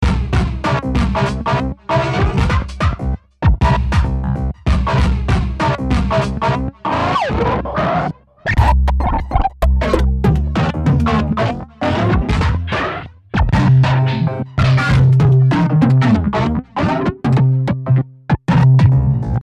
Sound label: Beat Scream (Beats), A noisy and wild drum kit; V-Synth